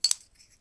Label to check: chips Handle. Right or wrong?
Right